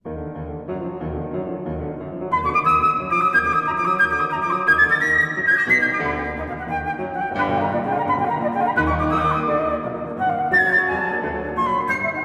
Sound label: Flute Sonata; Lowell Liebermann; Movement 2; II. Presto